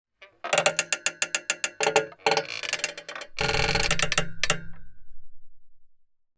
Metallic swing stress and pressure texture

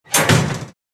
Kitchen oven oven door close; cooking

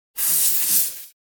Steampunk machinery compressed air release hiss

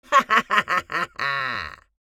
Elderly woman laughing

